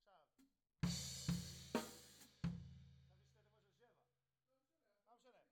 Floor

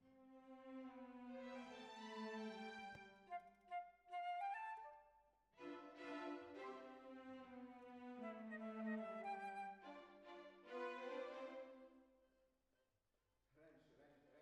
Clarinet

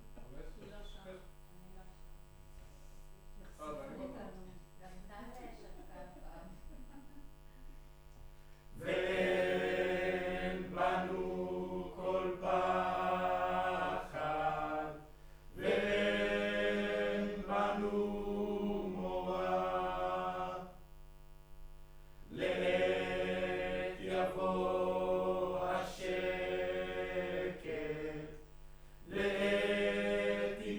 Room, Choir

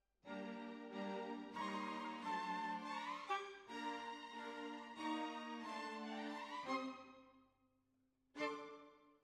Oboe

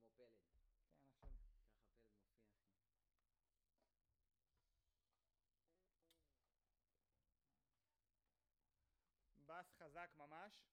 Trumpet

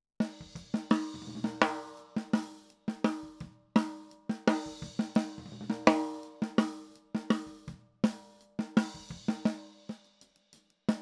Snare Top

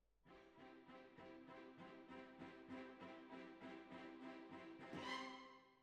Cbasses

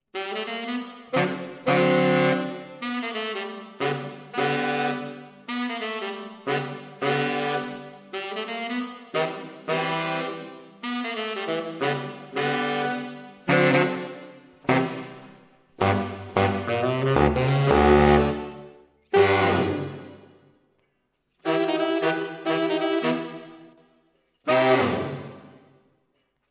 SAXES

